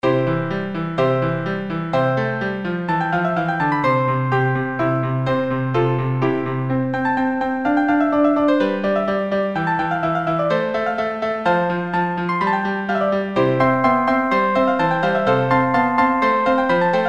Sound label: Regina Coeli K108 PRELUDE; allegro; piano; Mozart; Regina Coeli K.V. 108